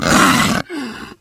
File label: threaten; russian; sven; russian sounds; tushkano